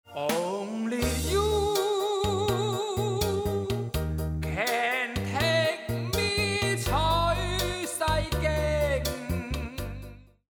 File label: round ten sec count; music kits custom